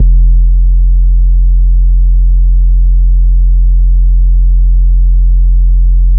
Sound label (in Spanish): ambiente